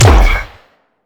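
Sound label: Sci weapon Shot Medium Gun Assault Rifle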